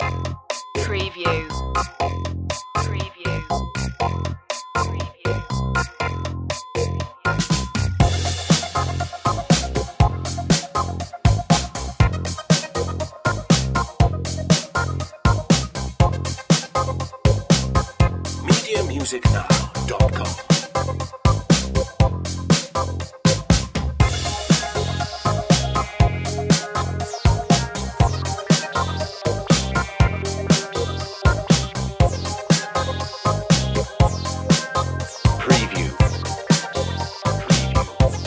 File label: electrowave